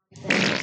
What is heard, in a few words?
crapping pants